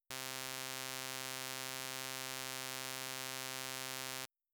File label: saw high; Wiwi Digital music technology